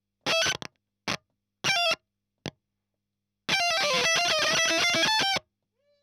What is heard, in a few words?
EL Fuzz End